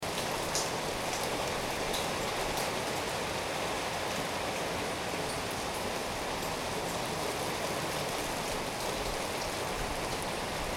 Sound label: rain